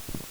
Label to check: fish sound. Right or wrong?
Right